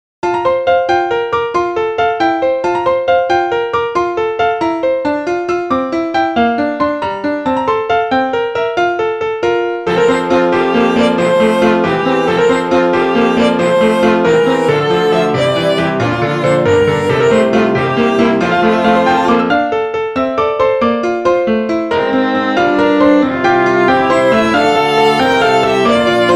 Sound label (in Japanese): ongakushitsunihibiku pianoqalutetto